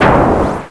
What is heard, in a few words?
Rubber Bounce; Casady & Greene; Crystal Crazy Demo; Rubber hat